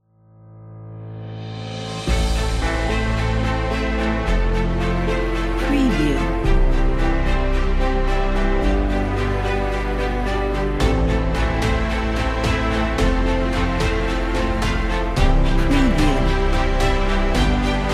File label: epic trailer